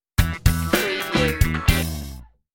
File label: cadillac stinger